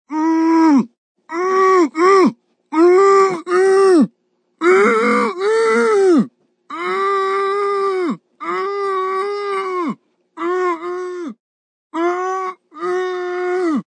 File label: Gagged Distress, Male, gagged, struggling, distress, long, (human voice); Vomiting